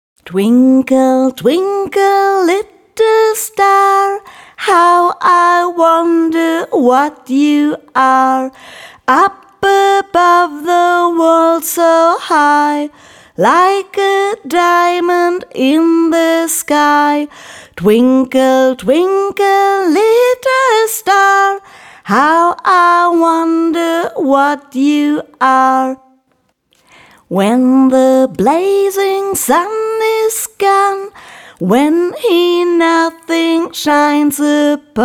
twinkle twinkle little star only voice

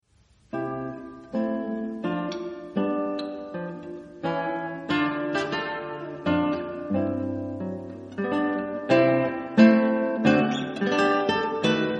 minuet c major

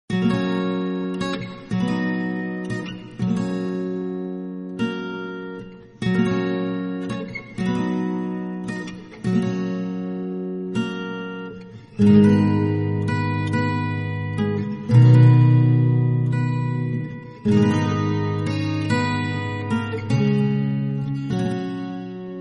dutch lullaby

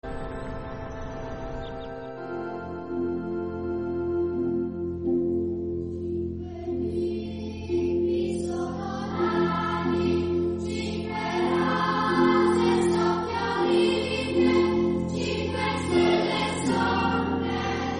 swiss lullaby

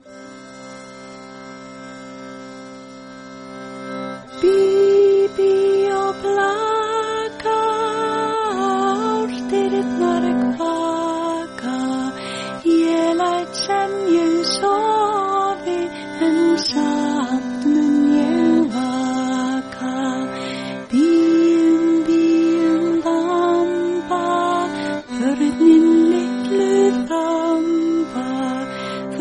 bi bi og blacka icelandic lullaby

